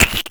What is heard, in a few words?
gurp; faerie